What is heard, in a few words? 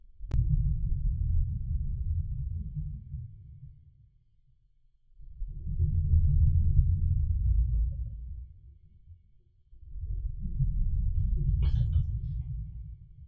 Storage Room Ambience